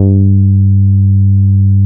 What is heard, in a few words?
NORD P G3, NORDY BS